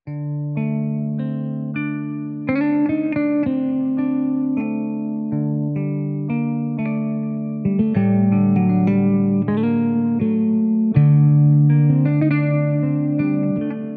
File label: STRAT AUSTERE